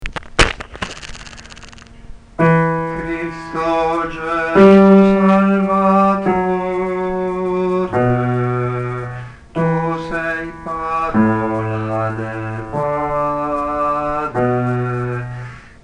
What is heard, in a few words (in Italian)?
bassi; Cristo Gesu Salvatore